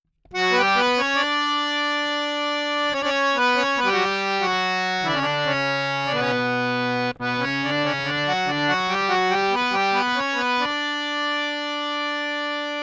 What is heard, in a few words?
Dry DM 4-4 70, Harmonioum; Sample Banks